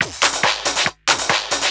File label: TECHNO PERCUSSION 140